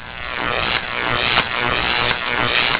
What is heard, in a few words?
strange